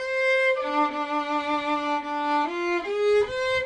violin loop; ORCHESTRAL and CLASSICAL

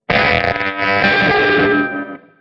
dunng eee, Guitar Noizes

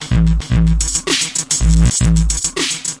bleepy n tough; LOOPING AMBIENCE

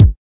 kik

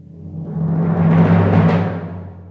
timpani roll; Western and Latin Percussion; single hits

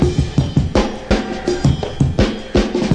083 reverb slow, drum loops

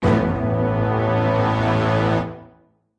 orchestra hit melody kit; PADS and STRINGS

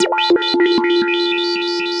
Synthesizer Middle C Notes, Lead

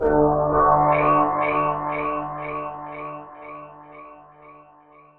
sh101 tone, Synth

